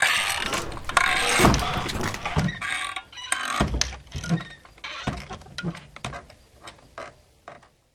pub door; DeadFright

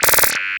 Hemostatic Clamp; Plastic Surgery

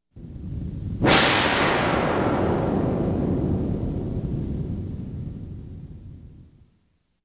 Science Fiction effects, Lasers, Light beams

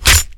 Bayonnet, Stab Into Flesh; gun and weapon sounds; captain audio; fully loaded

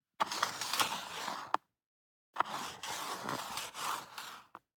DICE on Cardboard, Slide, Standard, 1 One Die; Samples; ArticulatedSounds; DICE Sfx Party (Extended)

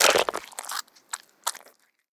zombie bite; DeadFright

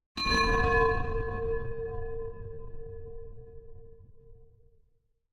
Impact Metal Moan Strong Pipe Hit - Short; Hollywood Edge; sound designer's toolkit v2

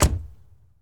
Truck Explorer Door Close; Trucks

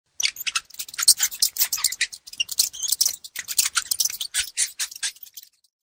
rat; Pitch Black - A Detective's Demise

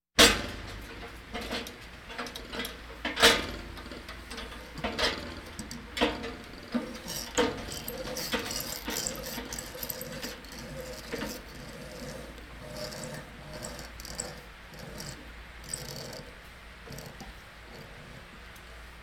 Hospital effects

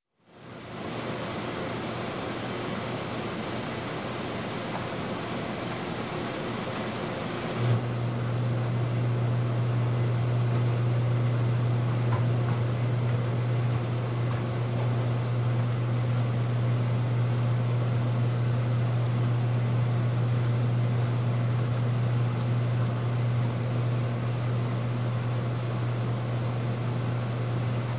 Laundromat, Washer Washer Tub Fills, Start Into; Start Into Cycle; Sound Ideas 2000 Series

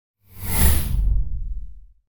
Whoosh Big Air; Hollywood Edge; sound designer's toolkit v2

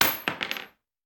Bullet Shell; Sound Ideas; Guns

